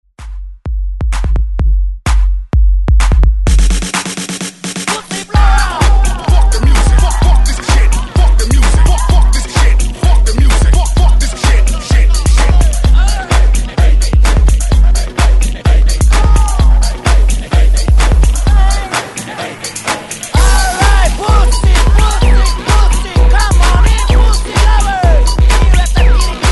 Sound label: Hype Re Drum 128Bpm